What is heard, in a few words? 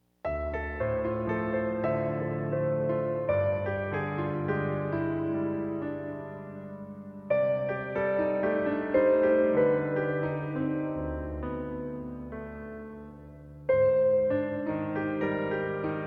Rainy Prelude